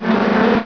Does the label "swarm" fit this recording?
Yes